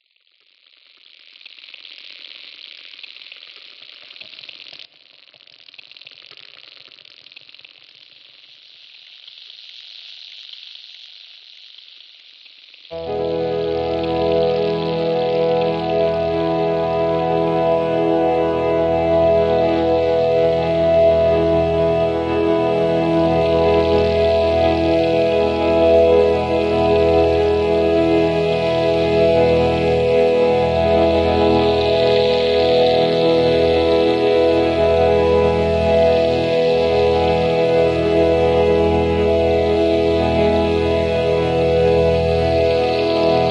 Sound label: Ambient music, sunrise